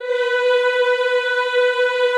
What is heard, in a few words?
Classical Choir, B3; Best Service; Extended Classical Choir